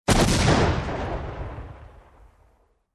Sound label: Bomb Explosion near